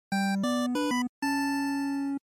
NES Action Start

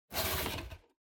Cardboard Box Open; ダンボール01-02(開ける); 効果音＞梱包材

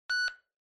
Handheld Transceiver Signal, Rx Beep

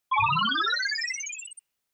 Scene Change Slow

